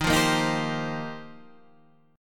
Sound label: F 7th Suspended 2nd Suspended 4th